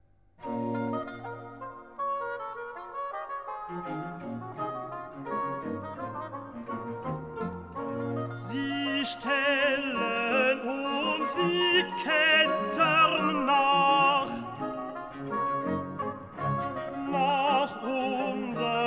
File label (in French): Choral de tenor, Hautbois I/II, Violons I/II, Violes, Continuo; Sie Stellen Uns Wie Ketzern Nach; BWV 178